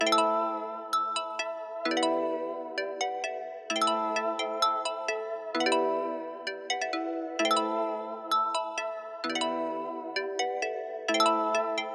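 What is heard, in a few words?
Japanese Guitar Pluck 130bpm E minor; New Nation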